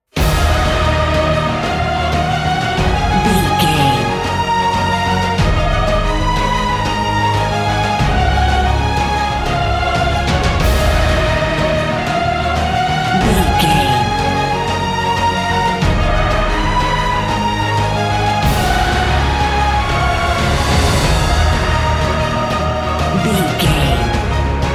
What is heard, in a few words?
epic cinematic